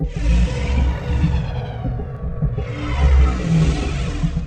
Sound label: stellarites idle breath; Stellaris; guardians